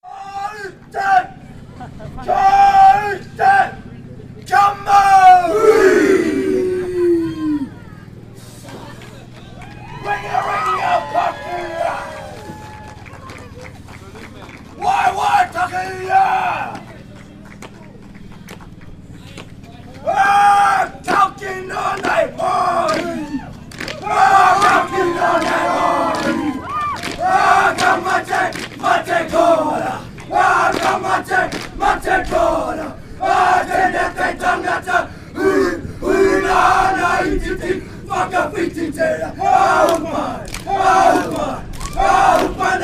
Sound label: New Zealand chant